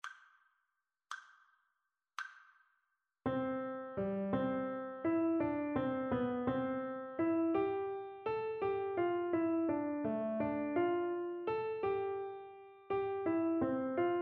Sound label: melodic dictation